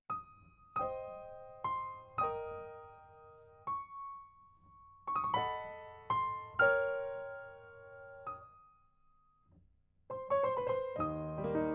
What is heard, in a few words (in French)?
Valse; Marie Jael